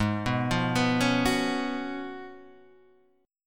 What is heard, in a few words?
Gb Major 7th Suspended 2nd Suspended 4th